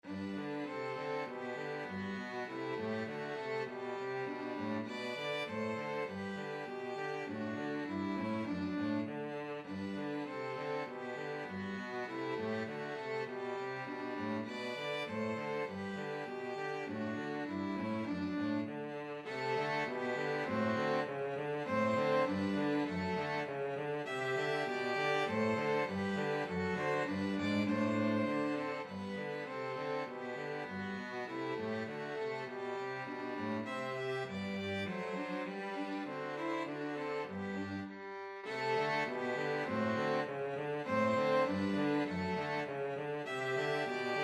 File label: melody, 2 violins cello